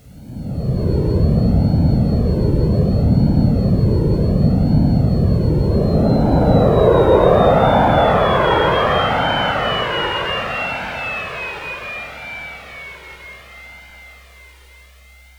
BOING 747, SF EFFECTS; Q Up Arts; Dream Experience

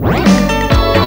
SPIN MY, STABS

